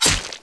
knife hit; dod 1.3; dod enhancement pack

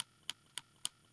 mortar move, weapons; dod 1.3; dod enhancement pack

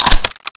bolt forward, weapons; dod 1.3; dod enhancement pack

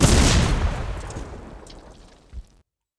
big gun, weapons; dod 1.3; dod enhancement pack

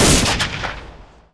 colt shoot, weapons; dod 1.3; dod enhancement pack

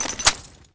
garand reload clip in, weapons; dod 1.3; dod enhancement pack

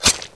knife hit; dod 1.3; dod enhancement pack

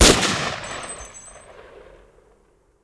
carbine shoot; dod 1.3; dod enhancement pack

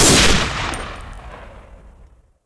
kar shoot; dod 1.3; dod enhancement pack

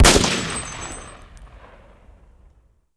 mp40 shoot, weapons; dod 1.3; dod enhancement pack

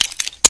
gren pin pull; dod 1.3; dod enhancement pack

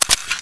colt reload clip out, weapons; dod 1.3; dod enhancement pack

